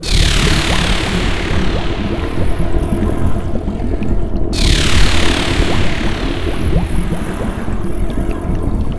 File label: power; Seer; GamigoNA